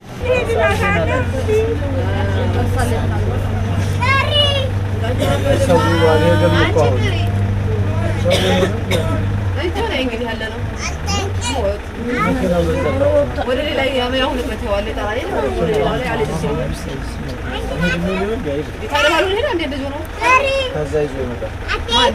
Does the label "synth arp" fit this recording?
No